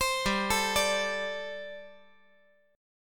G Suspended 2nd Suspended 4th; chordshape; down

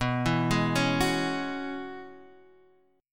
Gb 7th Suspended 2nd Suspended 4th B

